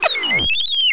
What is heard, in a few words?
Retro Computer Code Signal